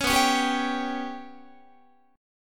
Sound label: B Suspended 2nd Sharp 5th down Guitar; Bsus2#5 strummed; JGuitar